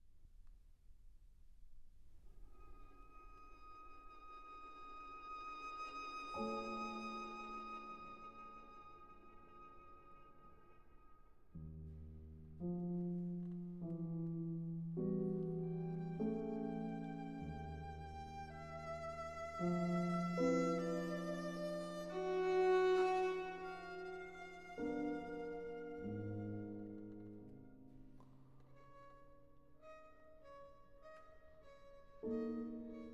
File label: Pieces for violin & piano, Sehr langsam